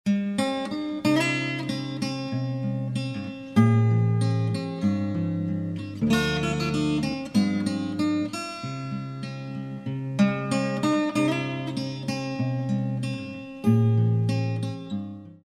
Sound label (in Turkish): santral fon muzikleri